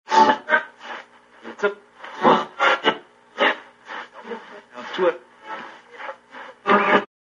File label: radio fm slow tuning